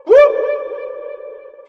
whoop male, voice; ooh ahh; ho hoo woo

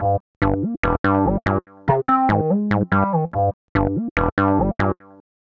crunchy, Loops Electronic

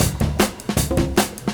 Funky Drum loops

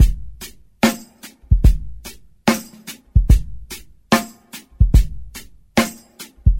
Drum loops, Boombap

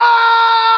scream

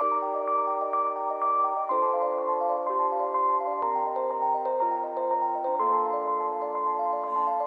magical, Electronic Loops

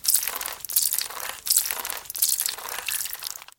squeeze pumping spurts

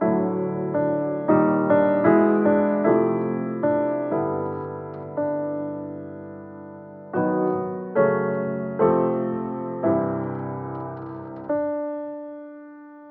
piano offbeat lament, Sad; loops

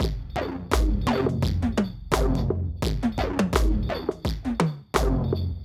ragga, Electronic Loops

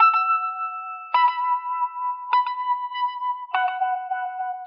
keys light plinks

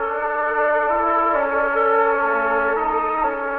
strings meander, Sad Loops

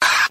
"ah" loud airy, Voice

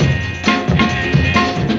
full, Loops Phonky

